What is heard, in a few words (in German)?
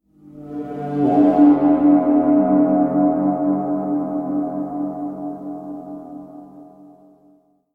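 unheimliche Horror Klingeltöne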